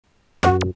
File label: exercise song